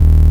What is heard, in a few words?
Bass short